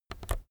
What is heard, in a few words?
keyboard external key release soft